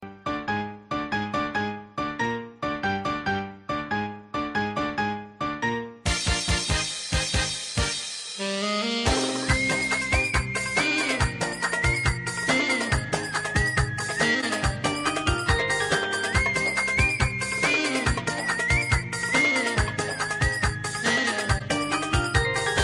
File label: Desert Melody